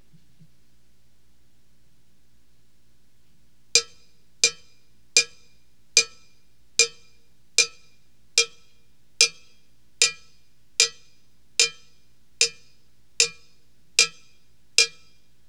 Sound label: COWBELL